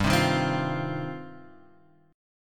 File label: G 7th Suspended 4th Sharp 5th; G7sus4#5 Chord {3 3 1 X 1 1} strummed